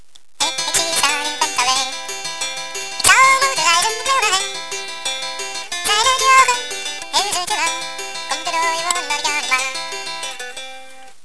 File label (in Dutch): gitaar